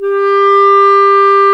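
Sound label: wind; Roland - LA Composer vol. 3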